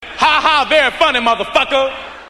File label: haha